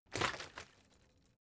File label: bottle water sparse shake single soft; berufe